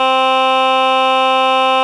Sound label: reed, SQ80